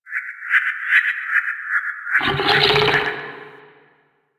Cave Creature; Ambience; One Offs; Creatures; Warframe; Quests; The New War; Drifter Camp

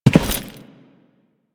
Step Default, Footsteps

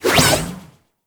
Shrink Baby Dodge; Warframe; Nokko

